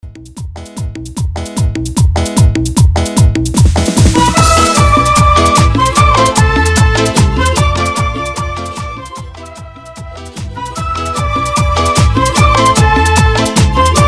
Intro Simple, Bpm 150

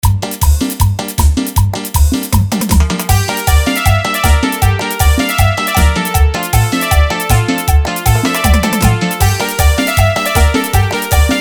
bachata con voz, Bachata Instrumental Chicha con acapella Bpm 157

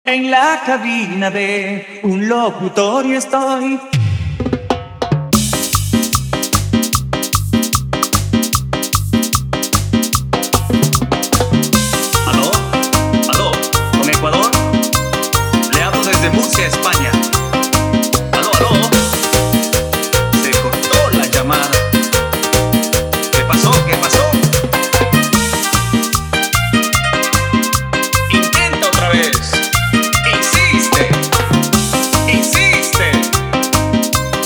Instrumental chicha, BPM 150

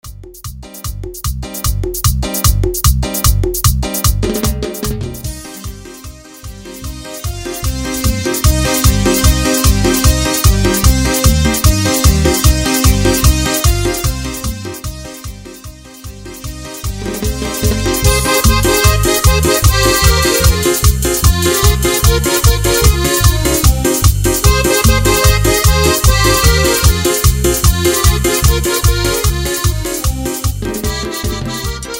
Intro, 150 Bpm

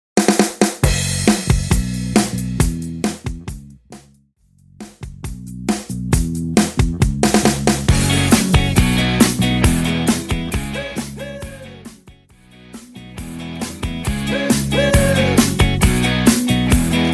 Intro Drums And Bass Simple, 136 Bpm

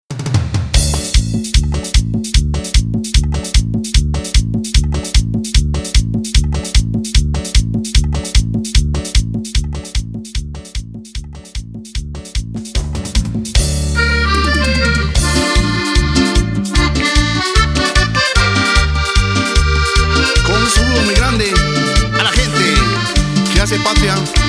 Acordion Intro Acordion

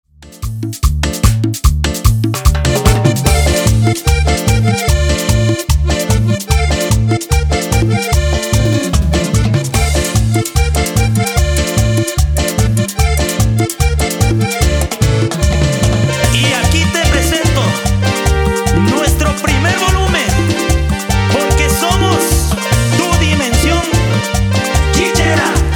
INTRO PERC BPM 148; DIMAX DJ MIX

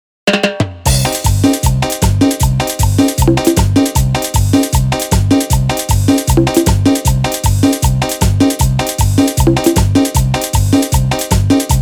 Instrumental; BPM 155

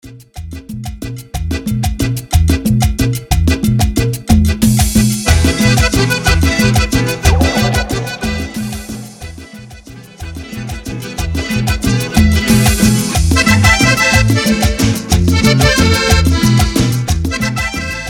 Intro Simple, Bpm 122

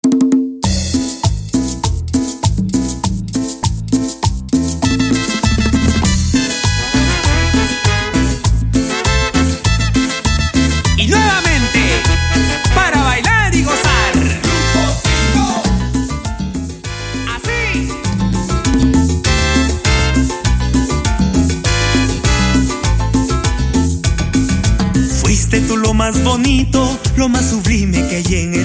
Intro Simple, 100 Bpm

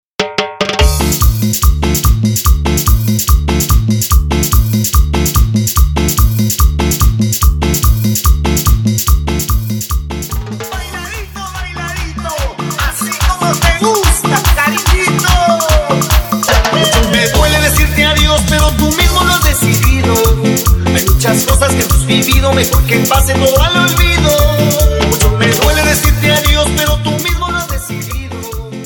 Intro Melody, 145 Bpm

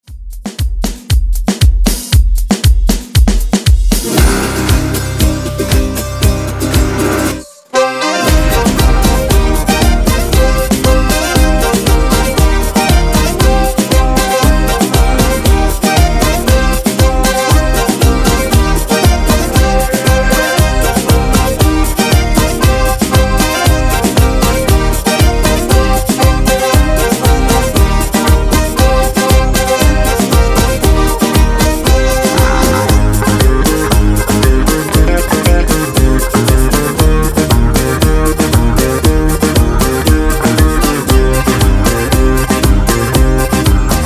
Extended Folklorton Drums

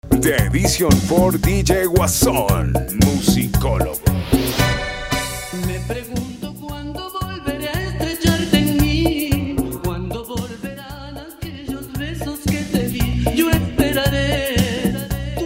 Chicha Clasica Extend Acapella 114 BPM

